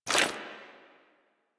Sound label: Generic Footstep; Warframe; Warframes; Dagath